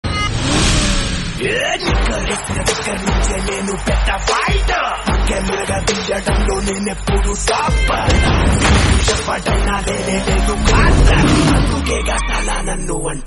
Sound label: trailer telugu bgm; liger trailer; vijay deverakonda